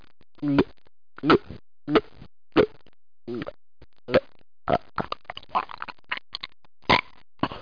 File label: HAIRBALL